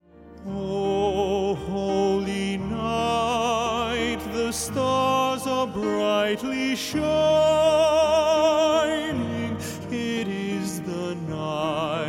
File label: tenors